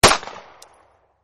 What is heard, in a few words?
9mm single, M9 9 mm Beretta Pistol Single Shot; Guns; VRSonic